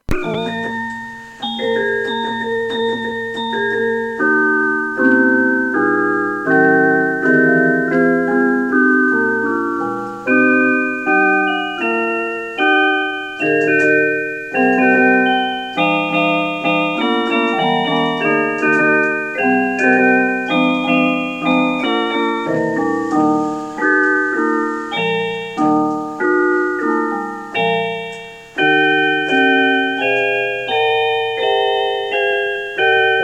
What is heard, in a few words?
playing Beethoven Ode to Joy on keyboard